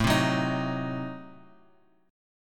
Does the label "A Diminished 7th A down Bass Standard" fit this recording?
Yes